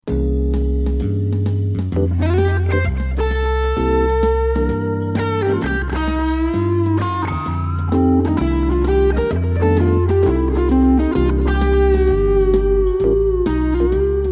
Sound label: blue cake jazz